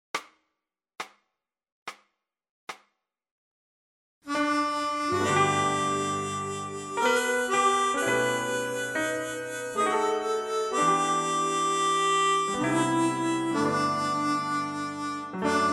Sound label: piano solo; armonica